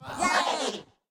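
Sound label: jump; Em Pulo; Super Mombo Quest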